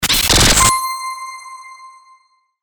CHIMED BREAKER

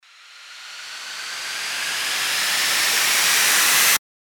RISER

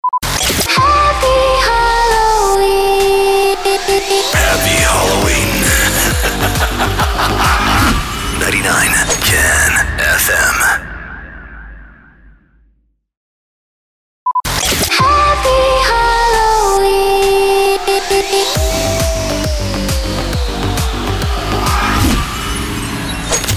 SWEEPER HALLOWEEN

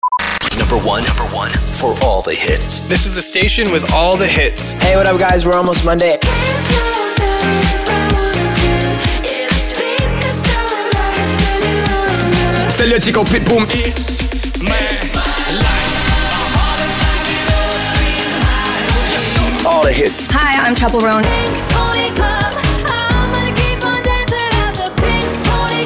sweeper beatmix

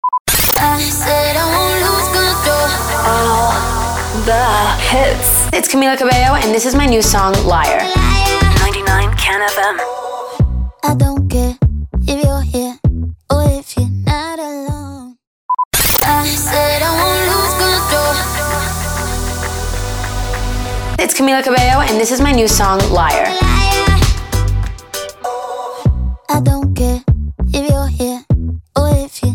POWER INTRO 98BPM

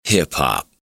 HIP HOP G

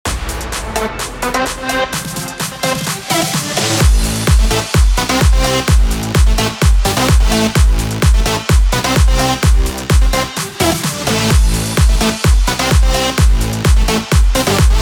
BED ELECTRO HOUSE AMIN 128BPM

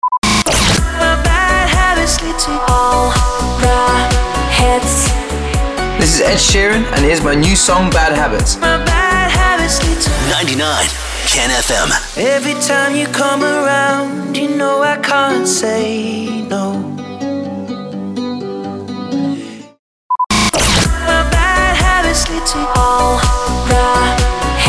SWEEPER POWER INTRO 126BPM

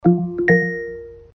Amazon Echo new announcement or reminder

